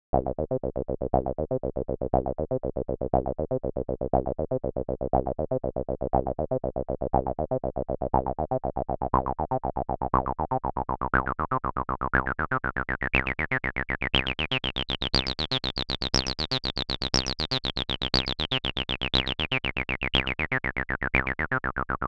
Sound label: PHOSCYON 2 Saw